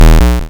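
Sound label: waveform FM square triangle envelope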